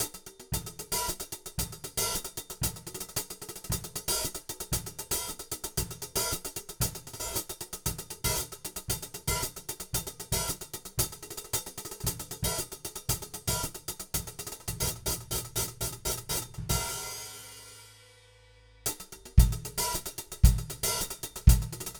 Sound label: Hi Hat